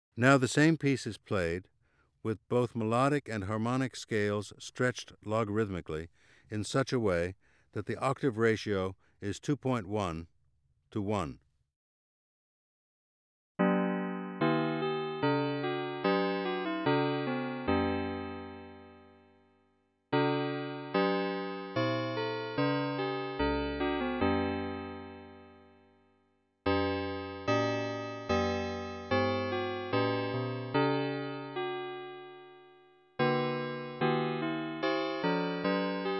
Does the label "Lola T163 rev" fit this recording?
No